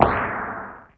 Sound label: thump spinner shot